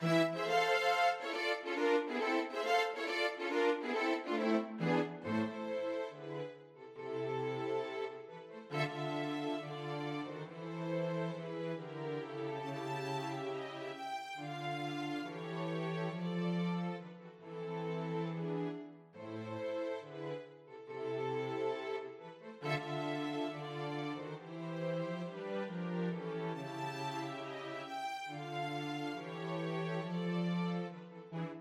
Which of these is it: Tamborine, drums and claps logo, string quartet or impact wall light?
string quartet